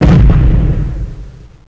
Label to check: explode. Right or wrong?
Right